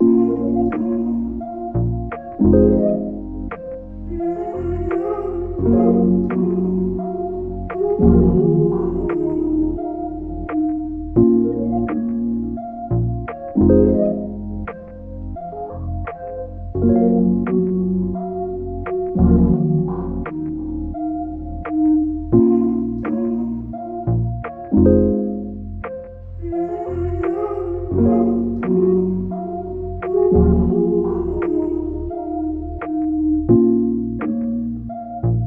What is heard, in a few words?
86 BPM A# Min, Melodies RnB; Cymatics; ZODIAC Beta Pack